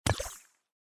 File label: bottom menu click